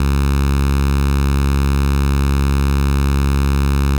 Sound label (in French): onde mini de phase